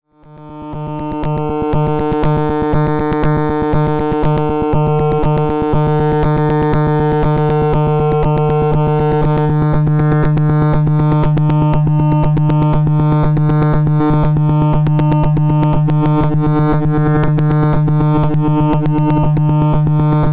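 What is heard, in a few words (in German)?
Flexshaper auf Dreieckswelle übersteuert; Klavis Module; Quadigy steuert 4 Parameter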